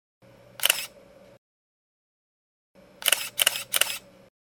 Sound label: canon 350d